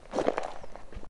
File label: push stone; opensurge